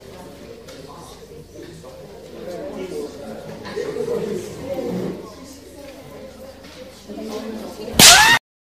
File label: Slap; Tik Tok